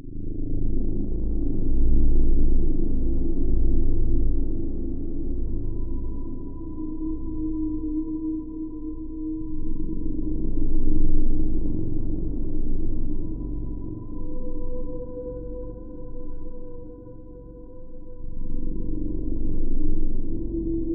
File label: glow atmospheric background